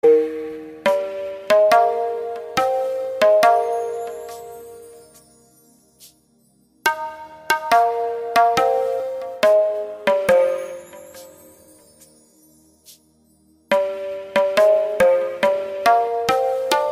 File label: Anthem Tabla Pakistani Ringtone